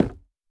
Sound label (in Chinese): 錾制书架破坏声